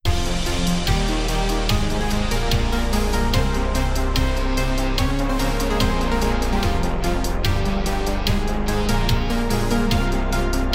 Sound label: Synthwave Remix; Soul Knight